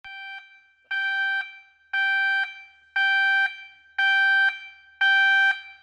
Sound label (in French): bip de recul